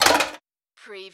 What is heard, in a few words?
Button Sound Metal Can Drop